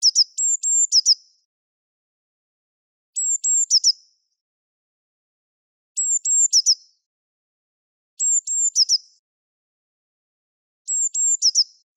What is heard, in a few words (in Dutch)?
Pimpelmees; Wageningen